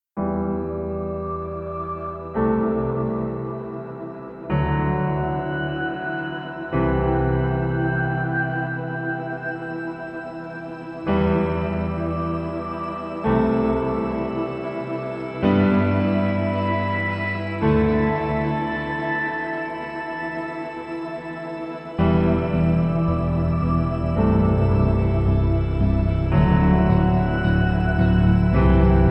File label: trailer intros rise again no perc mix